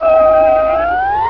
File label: Upwards; Ultima III; Ultima Sounds